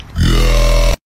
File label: yahh